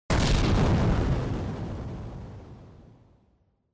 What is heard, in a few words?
Explosion Short